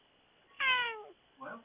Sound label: cat meow